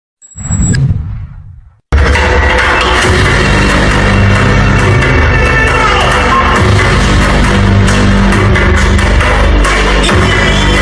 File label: warning scene mass bgm dolby 7 1; raayan; sethu; dhanush; sandeep kishan